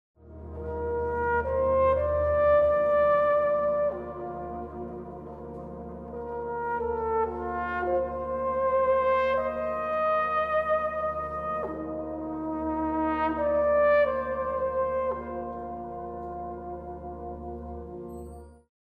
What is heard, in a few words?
horn cad